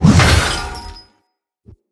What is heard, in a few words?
Sword Whoosh Heavy; Weapons; Enemy; Swords; Mnd King Swords